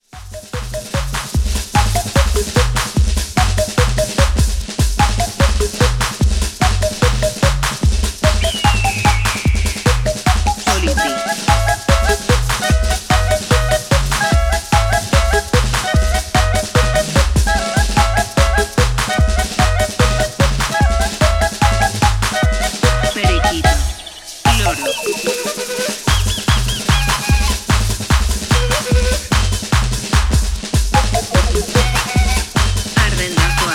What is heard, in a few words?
Dub